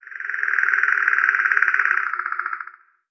click mid

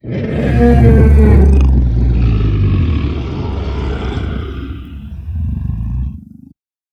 t-rex growl

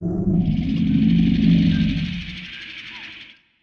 rapt click long

